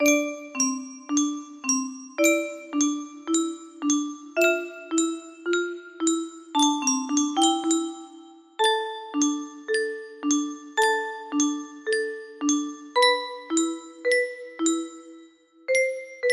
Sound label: staccato, music box